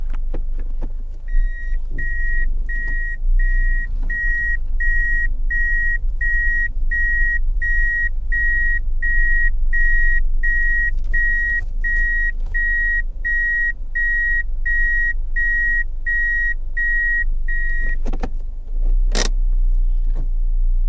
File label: going back, car